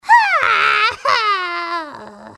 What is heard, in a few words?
toad crying